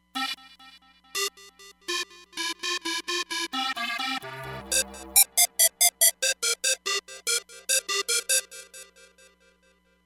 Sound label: Beep Melodie, Roland Fantom XR